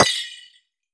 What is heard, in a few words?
soft spinner bonus; Azer+ 2023